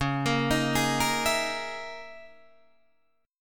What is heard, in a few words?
C sharp Minor 6th Add 9th C sharp, Guitar Open D